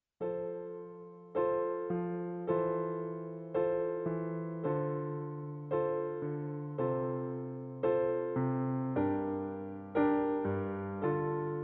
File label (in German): Playback F-Dur